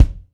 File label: Kick Drum